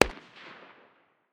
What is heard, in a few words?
ak 762 far distance; tacz-tweak-pack; taczmechanics